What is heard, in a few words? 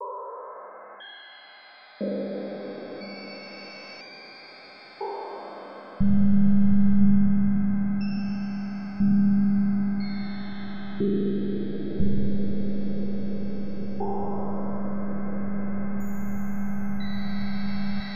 lin Trans Noise